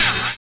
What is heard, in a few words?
collision; Spectrasonics; Metamorphosis